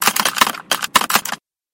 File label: monster cockroaches